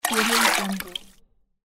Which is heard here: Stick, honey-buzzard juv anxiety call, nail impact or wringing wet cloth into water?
wringing wet cloth into water